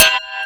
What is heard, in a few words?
METAL, Disjointed Textures; USB Soundscan vol.33; AKAI; BLASTER